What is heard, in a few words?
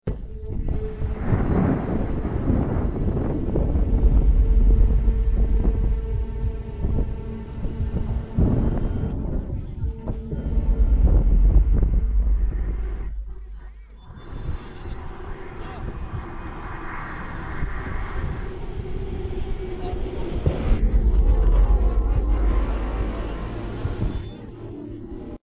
no vocals